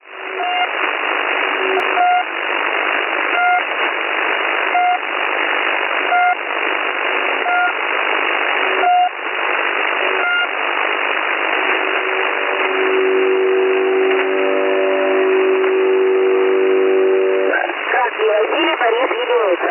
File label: Pip Message 3756 kHz; Perseus; Schwarzsee